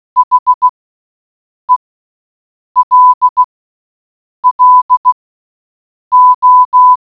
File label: Hello In Morse Code